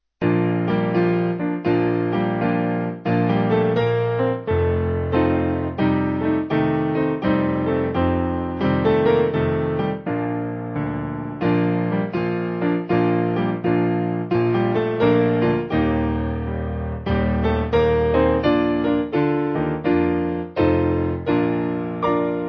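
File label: Piano, church music backing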